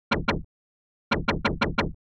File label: Laser Gun